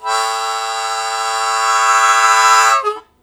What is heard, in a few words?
Blues Chord Attack A1, Harmonica; 90 s Sample CDs; Zero G